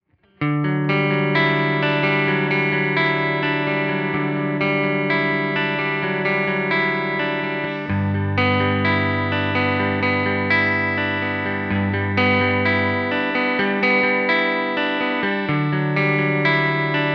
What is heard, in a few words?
pod x3 blackface vibro; line6